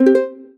PUZZLE Success Guitar Three Note Dry; stereo; Universal Sound FX

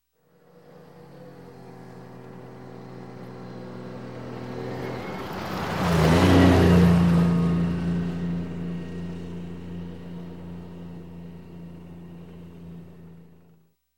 Military Tank; Sound Ideas 2000 Series

